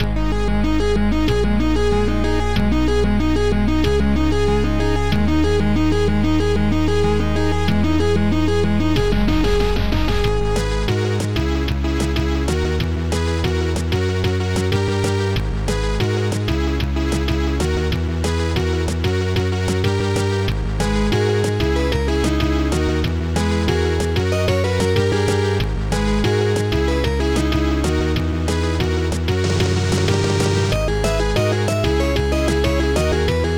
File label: XM; Amiga; TFMX; TFMX (The Final Musicsystem eXtended)